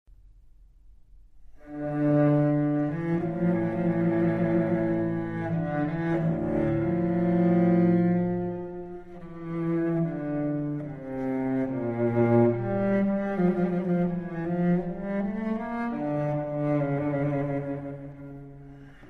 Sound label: Cello Sarabande D Minor; Cello Suite No2; BWV1008